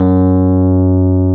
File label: piano Rhodes